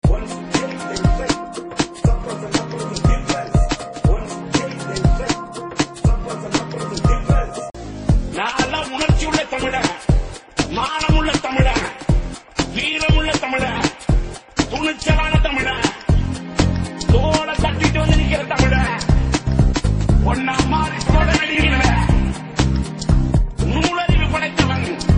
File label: Rap Song